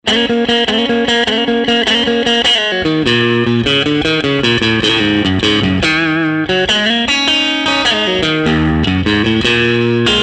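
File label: Strat60s middle Vibroverb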